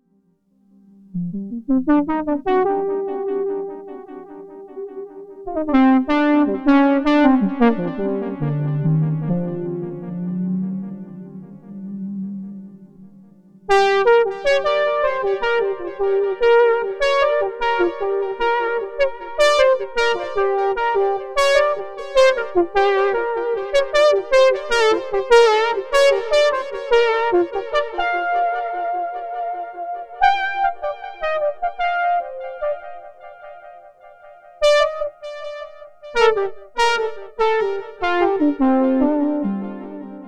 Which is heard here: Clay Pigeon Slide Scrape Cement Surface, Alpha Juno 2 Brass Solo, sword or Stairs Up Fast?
Alpha Juno 2 Brass Solo